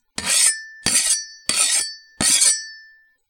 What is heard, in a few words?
Knife Slides